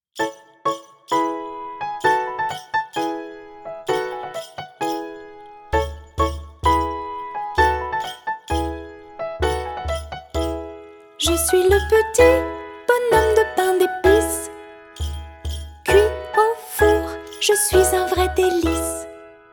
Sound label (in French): Comptine; Le petit bonhomme de pain d epice